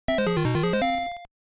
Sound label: Discovery Video Game Sound